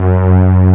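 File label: G2, SYNTHESIZER, analog STRINGS; AKAI S6000 CD-ROM - Volume 1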